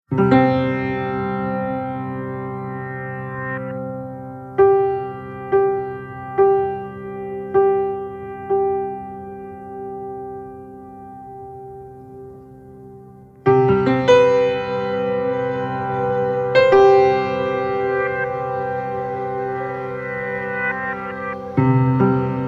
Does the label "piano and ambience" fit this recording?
Yes